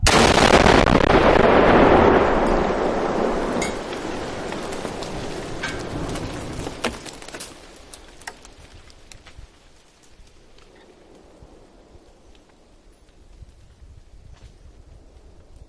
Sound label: Very Loud Explosion